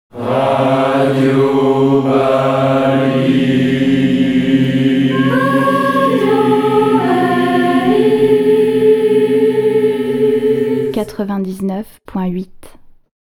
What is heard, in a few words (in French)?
Chorale; RADIO BALISES